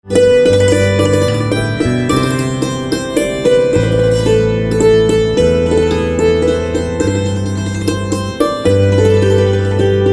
Santoor Instrumental Ringtone

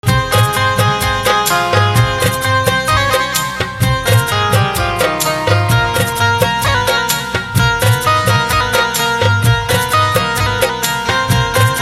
Banjo ringtone

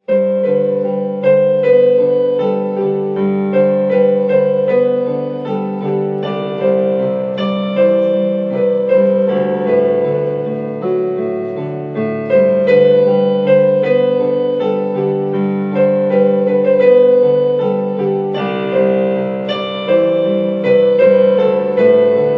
Piano

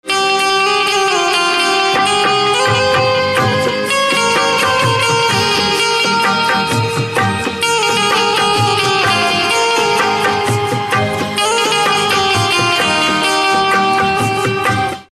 Banjo Instrumental Ringtone

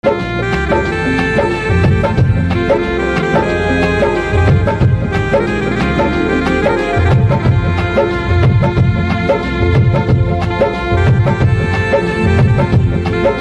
Saxophone Instrumental Ringtone

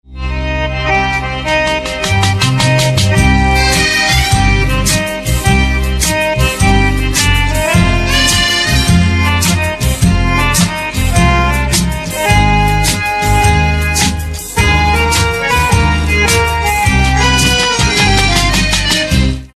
Harmonium Instrumental Ringtone

